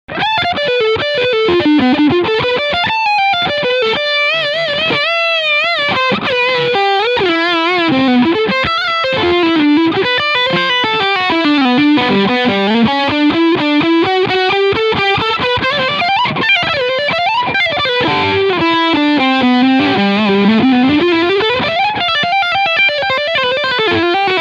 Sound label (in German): Cort X 700 Duality E Gitarre Leadsound Steg Humbucker